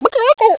bird; Bounce Touch

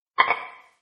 Rap bell; Lenovo A316i

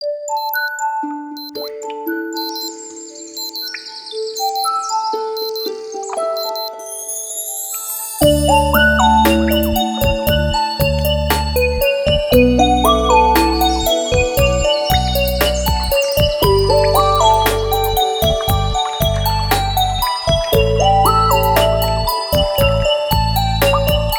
Alarm Beep; alarms

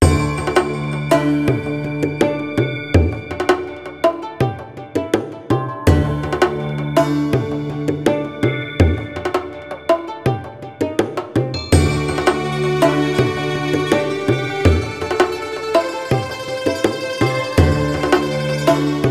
Morning Ritual Alarm, phonetones; Sony Ericsson; PlusFourSix; Lars Edvall

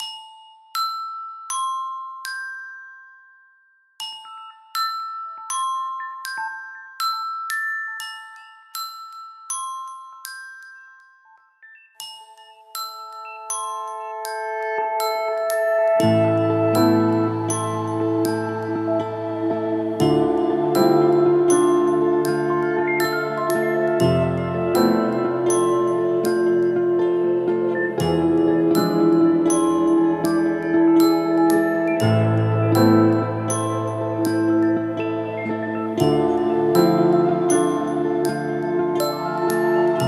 Gentle Wake, ringtones

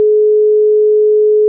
Ringback 425Hz 1500ms; Microsoft Lumia-550

